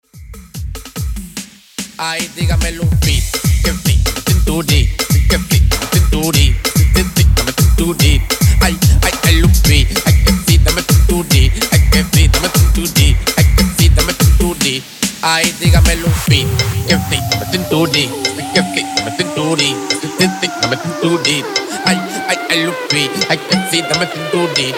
145bpm; mashup

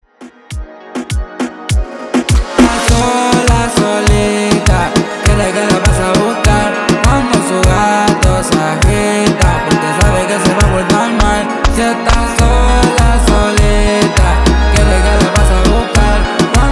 Intro Verso 101Bpm

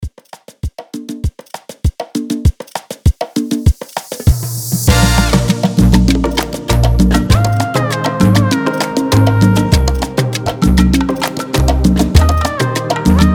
salsa version 99 bpm

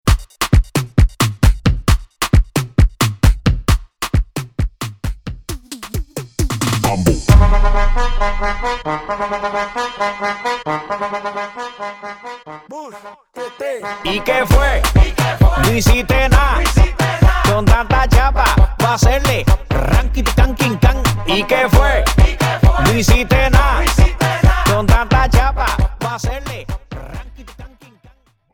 Intro Splice 133 BPM

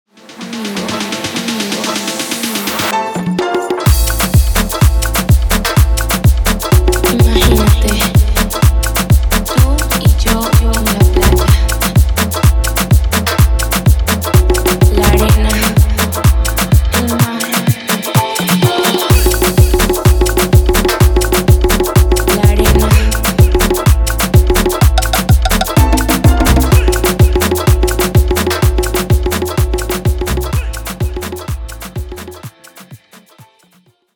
mashup 126 bpm

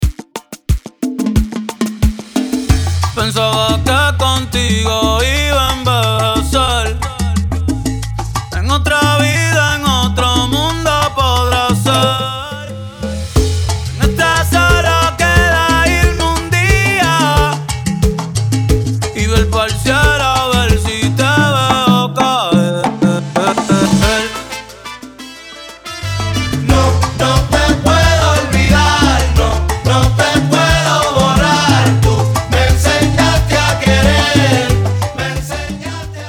90 BPM Salsa

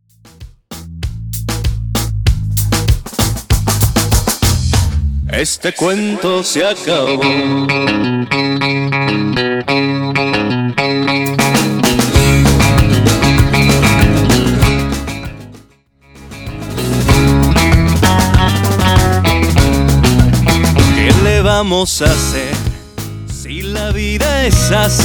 Intro Break 97 BPM

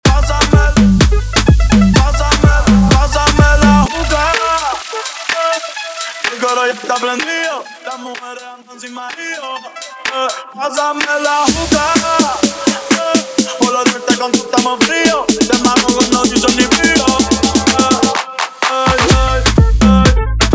Afro House Remix 126 BPM

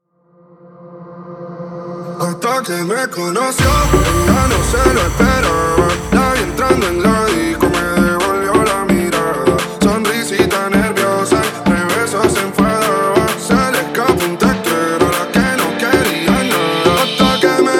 intro outro 130 bpm

